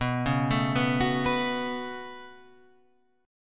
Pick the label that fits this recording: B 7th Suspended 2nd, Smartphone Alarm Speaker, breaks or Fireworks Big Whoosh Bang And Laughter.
B 7th Suspended 2nd